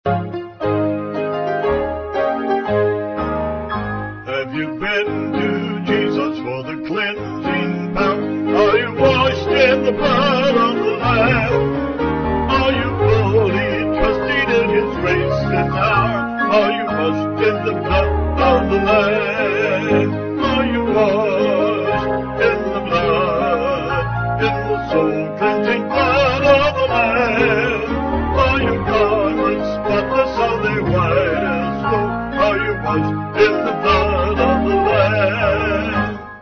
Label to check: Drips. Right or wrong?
Wrong